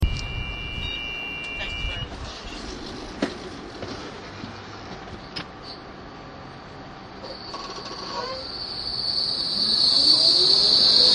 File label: tram departing from station street